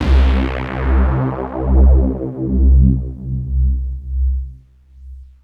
Bass Synths hits and octaves

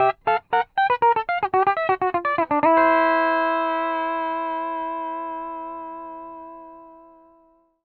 PD LOOPS 120, Whole Lotta Country

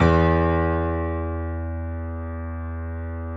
JAZZ E1, Keyboards Acoustic; USB Soundscan vol.17

